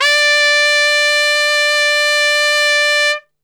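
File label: D 4 piccolo trumpet, Brass; Best Service; Brass Super Section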